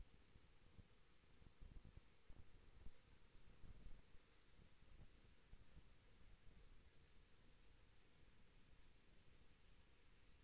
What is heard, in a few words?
HYDROPHONE 16000Hz; JASCO AMAR HYDROPHONE 740